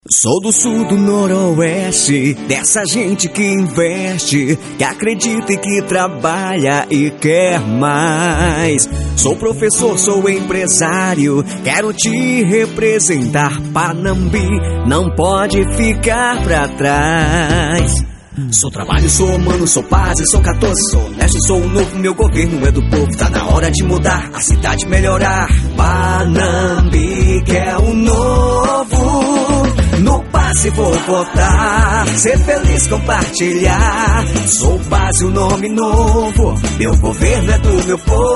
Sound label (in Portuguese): Jingle politico